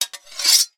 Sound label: warfare sword remove from stand; zapsplat